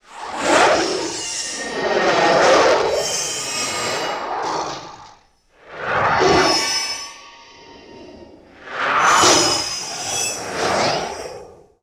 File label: Super Jets Overhead, Sound Design - Sci-Fi; Sony Pictures Sound Effects Series Volumes 1-10; Sony Creative Software